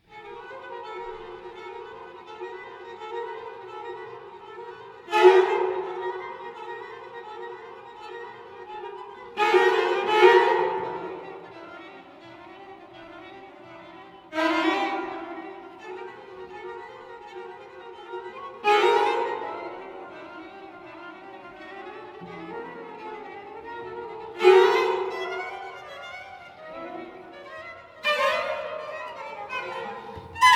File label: String Quartet, Allegro